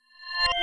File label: Shutdown; Windows Never Released Soundpack 1; deviantart